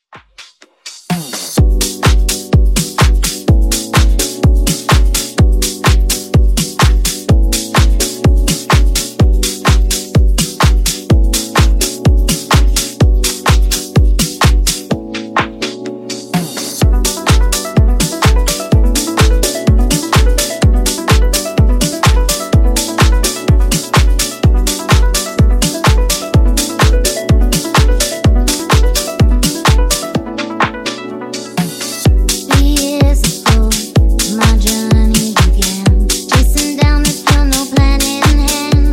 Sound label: Funk 126Bpm; Edit2